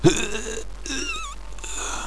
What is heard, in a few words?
death; firearms